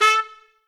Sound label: Trumpet melo